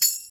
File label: tamb, Tambourine; Idiophones; Struck Idiophones; Tambourine 4